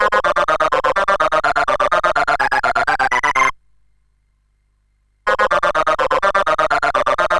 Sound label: sid CSG8580R5; Omidar